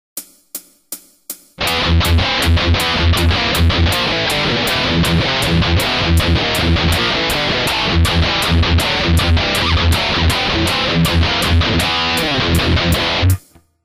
shred, guitar

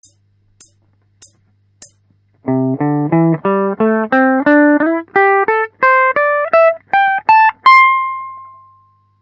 blues

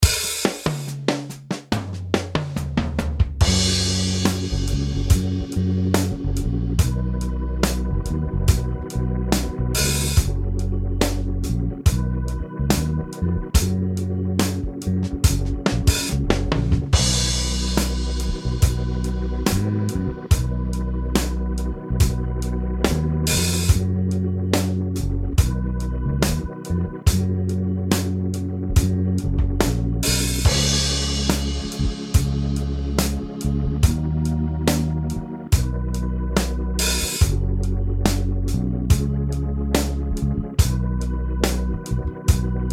slide solo backing

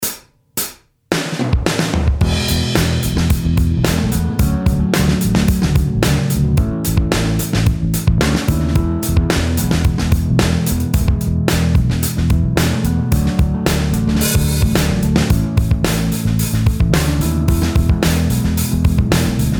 slide backing

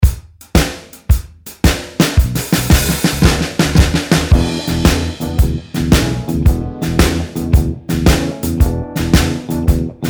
slide backing

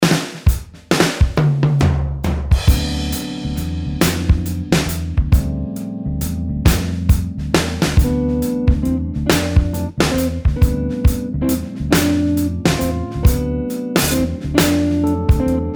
improvising backing

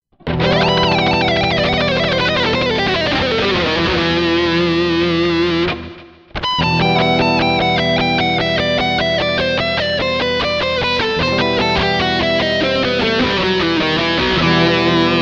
shred, guitar

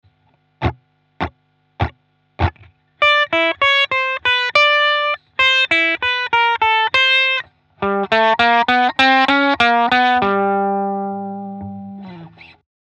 blues, guitar

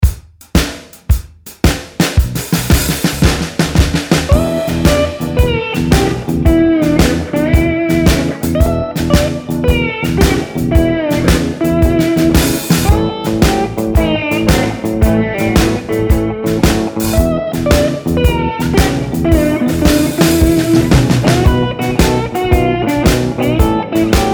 slide

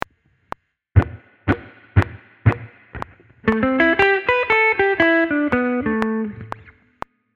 blues track

